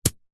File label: leisure hacky sack land on grass